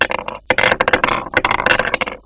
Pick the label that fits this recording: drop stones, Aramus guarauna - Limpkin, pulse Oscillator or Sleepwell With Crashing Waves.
drop stones